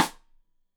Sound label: Side Stick Stereo; Deeply Sampled Drums; SMDrum